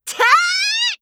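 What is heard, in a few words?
attack, Voice Line, Korean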